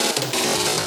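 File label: drum beat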